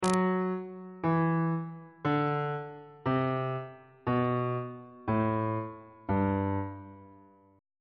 f double sharp major scale descending on bass clef